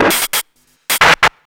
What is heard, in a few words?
MD 909 150, Hard & Loud Techno; USB Soundscan vol.01; AKAI